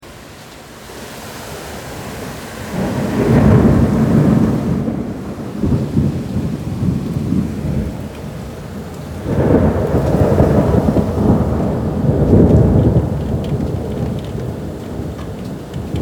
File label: thunder